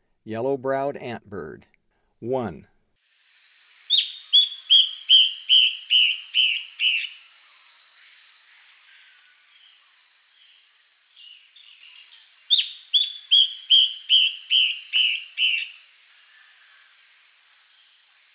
Yellow-browed Antbird; S. America; Eastern Lowlands

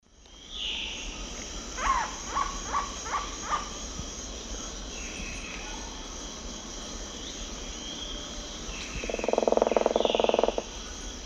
Celeus elegans, call and drum; S. America; Eastern Lowlands

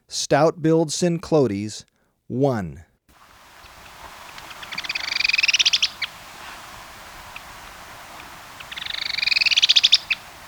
Stout-Billed Cinclodes, Birds of Ecuador; S. America

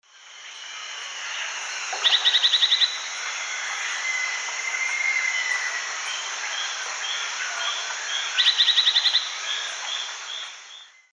Euphonia rufiventris, calls; S. America; Eastern Lowlands

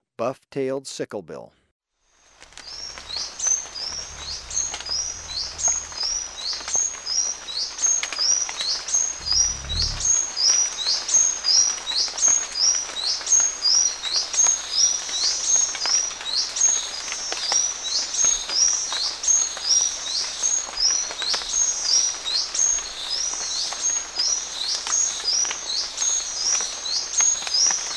Buff-tailed Sicklebill, Birds of Ecuador; S. America

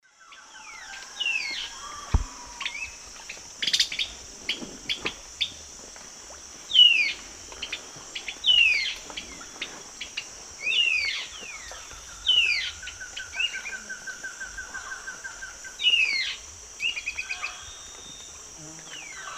Sclateria naevia, calls and subsong pair; S. America; Eastern Lowlands

